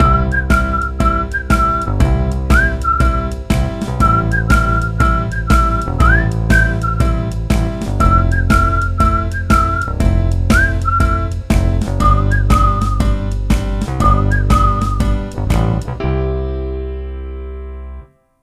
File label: Jingle